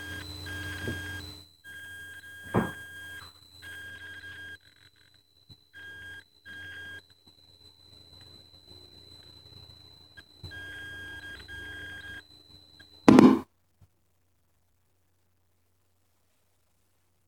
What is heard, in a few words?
Shutdown